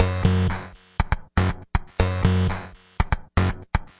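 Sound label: 120 bpm reso buzz beat, drum loops and breaks; 111 to 120 bpm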